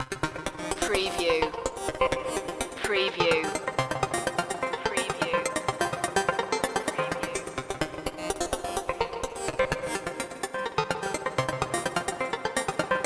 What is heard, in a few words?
spikey loop